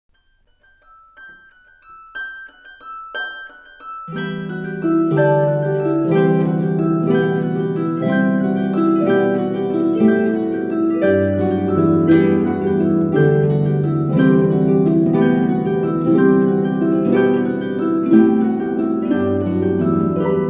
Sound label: christmas bell carol, christmas carols on the harp